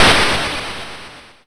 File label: Snare, YM, CHIPSHOP HITS DRUMS; ChipShop Deluxe